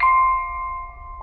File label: music box; Bells